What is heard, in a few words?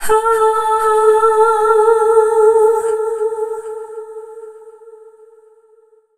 Dance Vocals, FEMALE